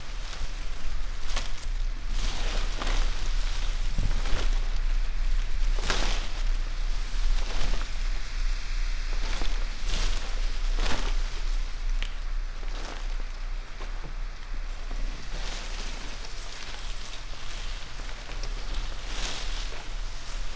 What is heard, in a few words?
tree palm rustle